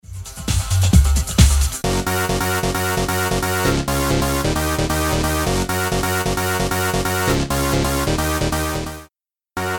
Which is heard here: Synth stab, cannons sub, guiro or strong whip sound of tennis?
Synth stab